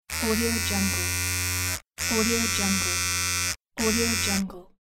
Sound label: razor buzz